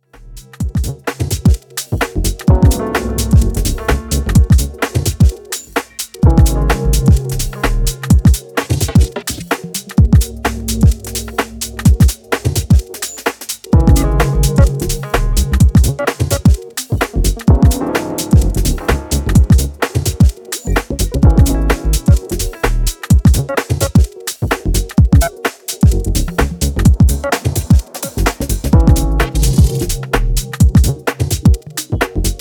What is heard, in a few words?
SCRATCH